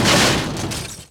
crush, Impact

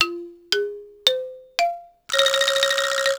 fish close; fishing; Rapala Pro Fishing; XBOX

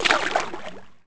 swim RIGHT; The Elder Scrolls III Morrowind GOTY; XBOX

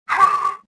pain; Star Wars Jedi Knight II Jedi Outcast; XBOX; shadow

